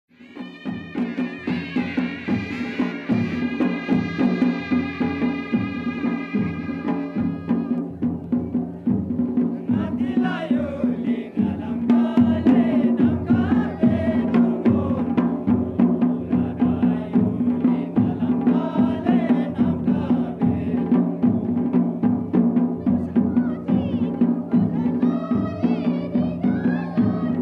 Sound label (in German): Ladakhfestival mit Gesang